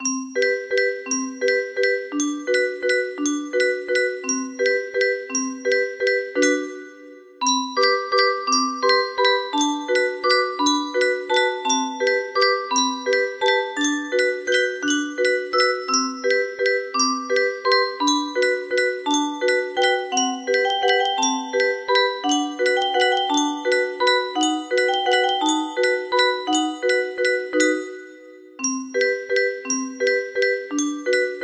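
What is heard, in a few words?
music box version, music box melody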